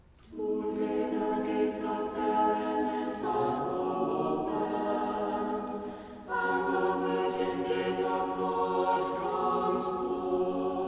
Tone 6 Kievan Chant Sticheron Example SATB

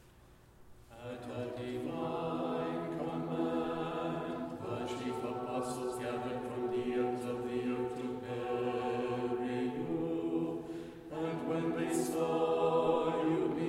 Tone4 Common Chant Sticheron Example Bass

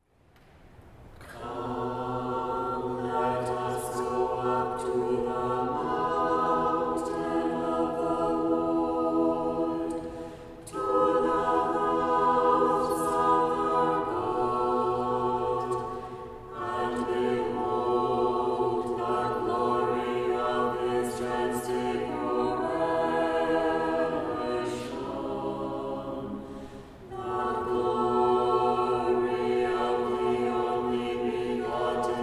Tone5 Common Chant SATB; chanting tutorial

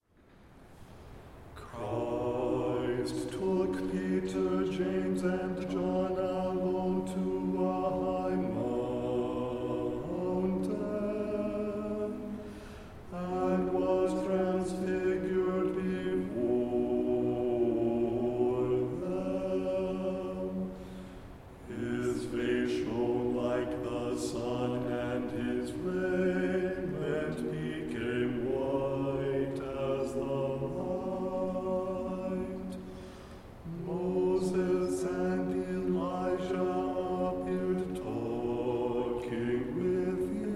Kievan Chant Bass; chanting tutorial

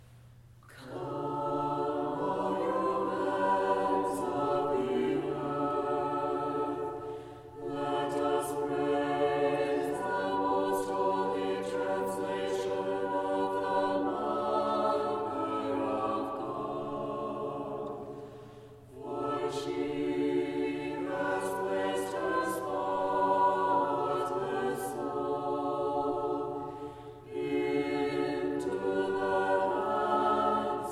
Kievan Sticheron Example SATB; chanting tutorial

